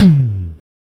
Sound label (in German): TomTom Mittel